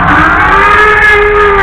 alarm; jurassic park

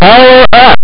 power up; altered beast

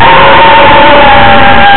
animal scream; altered beast

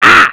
"aah"; beavis and butt head

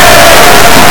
football crowd noise; sports pad football

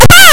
death; galactic protector

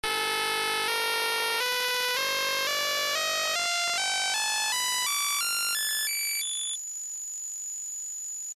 Noise ch2 0x10 to 0x00

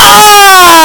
aargh; space harrier

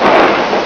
thunder; chakan

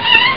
miaow; neko dai suki

